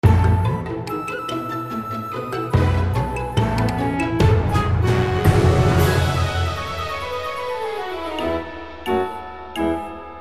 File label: Rolling to Victory; zack wiki quest for barbaros treasure